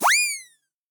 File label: Run